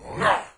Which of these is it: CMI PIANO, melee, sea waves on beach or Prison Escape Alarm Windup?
melee